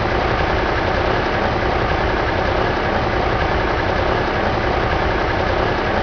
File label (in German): lkw lauf; Manus Civil Package